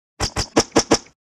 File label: attack; hero